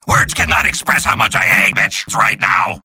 kill; freak fortress 2; mad machine

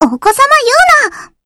hit

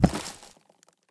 step, footsteps; freak fortress 2; sniper ops; nighteye

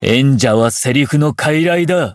rage; ff2; havoc blackcell

